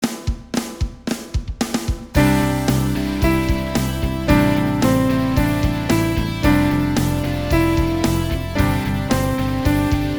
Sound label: Garage Band FAST